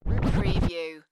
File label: Turntable Scratching